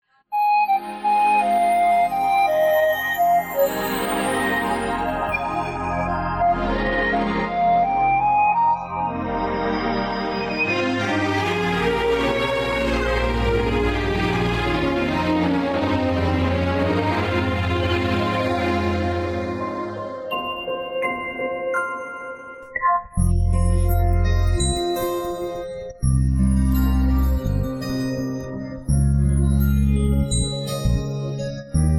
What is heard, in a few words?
Vocal Removed HD Karaoke; Greentunez